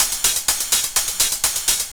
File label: heli hats, Deep House 125bpm